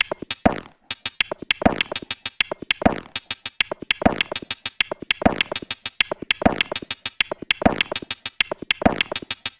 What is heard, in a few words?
100 Perc Crosswire; Percussion; Zero-G; Future Wave